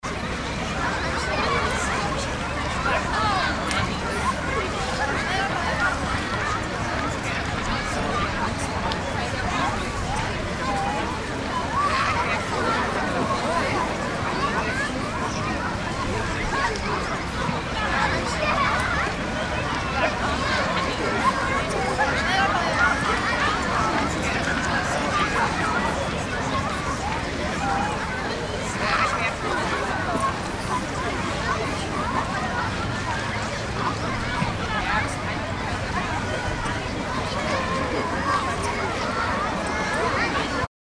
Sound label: seaside atmo